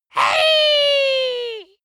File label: dwarf cheer "hey"